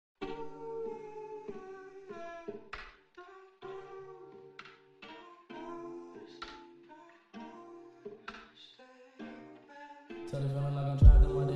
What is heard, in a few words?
Music 3D Hip Hop